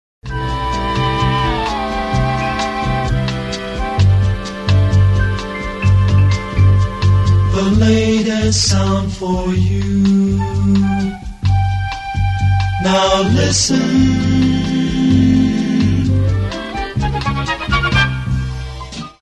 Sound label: jingle